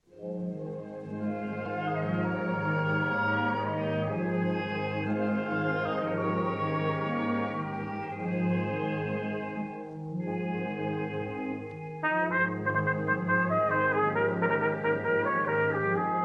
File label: Lullaby, Trumpet; A Trumpeter's Lullaby